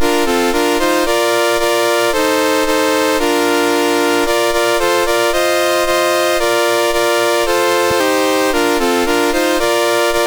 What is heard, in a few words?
Transkei, National Anthems, MOS 6581; MOS6581R3